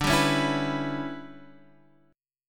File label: E Minor 6th D, Em6/D chord; strummed; JGuitar